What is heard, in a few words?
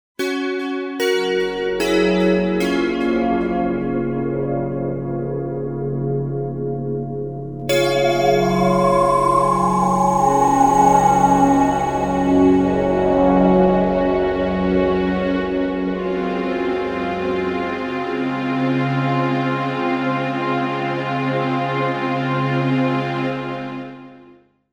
jingle sample